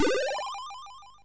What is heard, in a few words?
Fuzzball, MOS6581R3